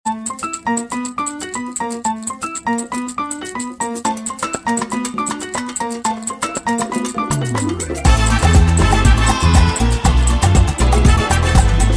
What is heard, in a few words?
Brazilectric, ringtones house latin; ghia productions